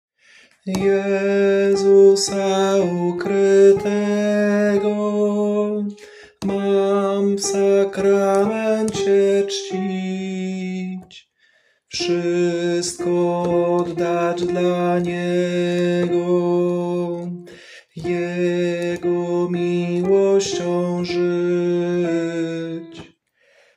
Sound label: Tenor; nagrania glosow